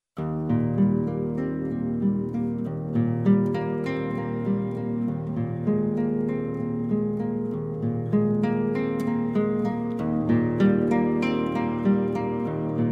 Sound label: Echanted land